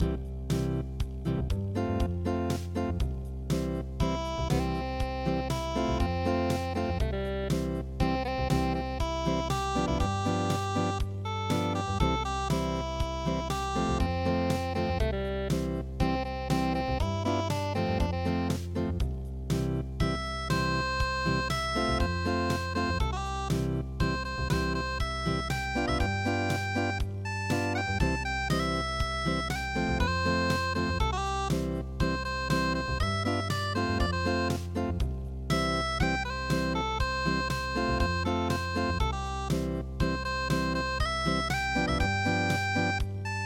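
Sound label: unplugged; Swing low Sweet Chariot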